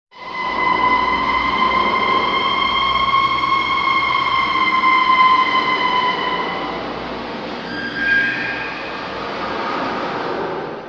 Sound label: Ambiance - High pitched eerie metallic screech x4; Hollywood Edge Sound Designers Tool Kit